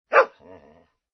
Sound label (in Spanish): perro grande